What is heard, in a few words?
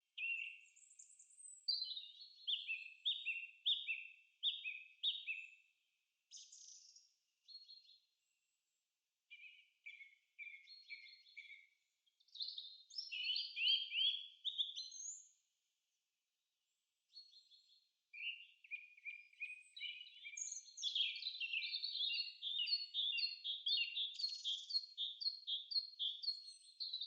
Forest sounds